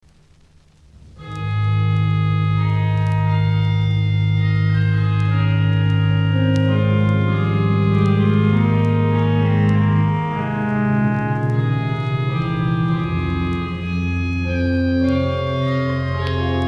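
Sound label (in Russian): Prelyud lya bemol mazhor, prelude in A flat major; M.K. CHyurlenis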